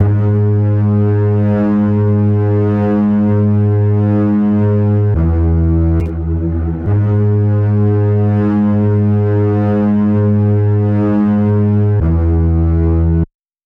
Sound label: bass